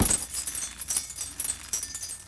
chandelier Hit; impacts explos

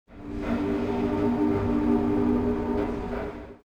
steamboat distant; Soldier Of Fortune II Double Helix; XBOX; ambience

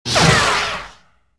saber bounce; weapons

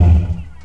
Dodge Ram Stop, vehicles

